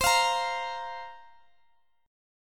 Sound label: C 7th Suspended 4th, Guitar, Open D